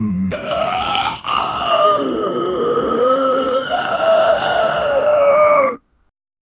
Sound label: realistic male zombie